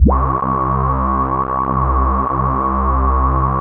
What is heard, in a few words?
CHUNKY C3, Drums; Drums In Your FACE!